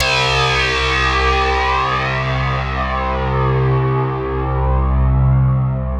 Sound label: Steelwire, ambient